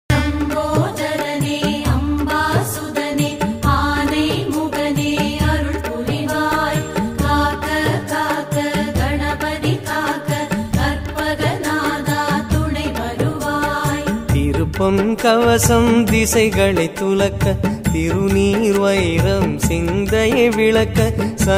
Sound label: ganapathi Pillayar vinayagar songs, TAMIL DEVOTIONAL SONGS; கணபத படல பளளயர